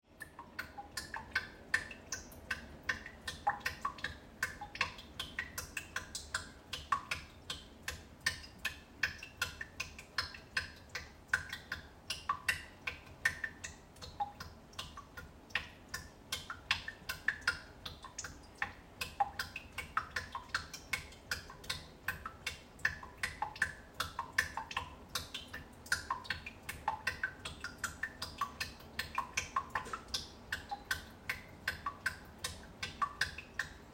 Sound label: Sink noise